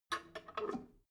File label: drain valve close regular, badezimmer; haus garten